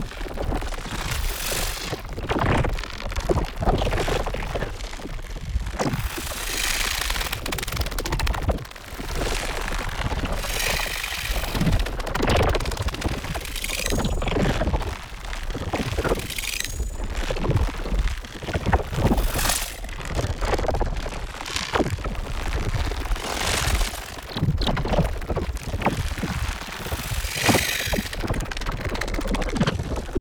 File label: seed idle, anomalies; Arrival